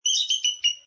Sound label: Bird of prey: American bald eagle sound, peeps several times